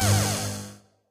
deflect shot; Adventure Time - Angry Betty; ThrillGames

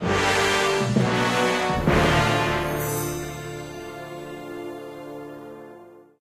music game over stab; run-dorothy

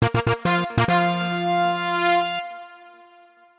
win stinger; Nick Summer Sports Stars

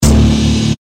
backing track chunk; Adventure Time - Elemental; ThrillGames

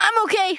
lose; loudhouse sticker

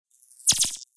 bzz; Phineas And Ferb - Backyard Defense

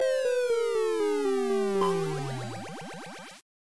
fail; Gumball - Go Long!

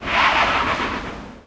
spinning car wheels; Fire Station; klankbeeld

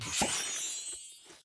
object appear; run-dorothy

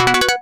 UI play; Power Rangers vs Teenage Mutant Ninja Turtles - Ultimate Hero Clash 2

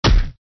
enemies fall; Adventure Time - Break The Worm; ThrillGames

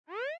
change Stage; Food Farmer

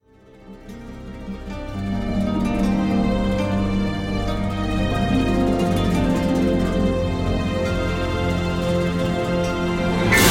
market music intro; The Adventures of Puss In Boots - Barrel Run; NextlevelGames

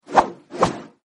attack; bebop

